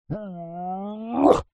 fantasy giant male vocalisations fighting

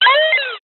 science fiction radio signal interference burst

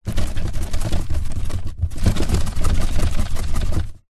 foley cardboard box empty open shake; zapsplat

